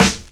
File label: SD, Dance Mega Drums; Best Service